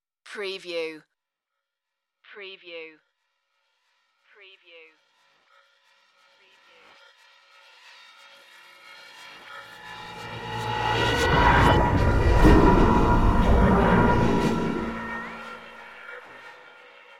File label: abstract passing mystery